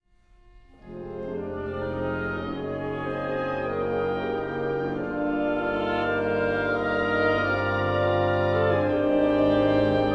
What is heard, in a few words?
Fanfare